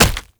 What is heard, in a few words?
Step; Enemies; Steps; Proto Infested; Warframe; Brachiolyst